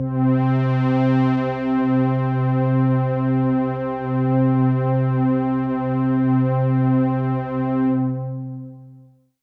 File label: Pad C, Synth Prophet One Shots; Electronisounds